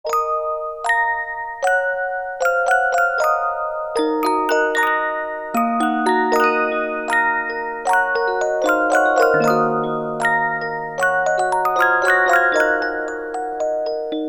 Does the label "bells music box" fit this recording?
Yes